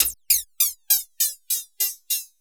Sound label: Electronica Drumloop; Drums